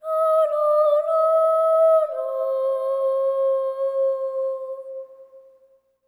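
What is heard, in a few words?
BOY phrase, Symphony of Voices; Spectrasonics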